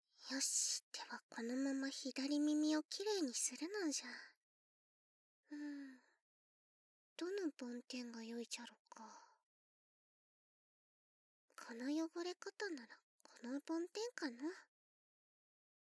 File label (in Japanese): かさかさ梵天; きいろのお狐様